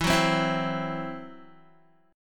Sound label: E Suspended 2nd Sharp 5th, Guitar, Esus2#5 chord; strummed; 11,9,12,9,12,x